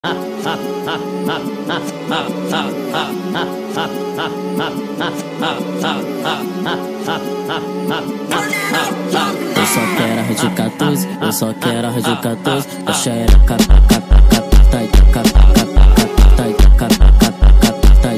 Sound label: Phonk Funk Sped Up